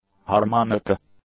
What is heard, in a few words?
harmonica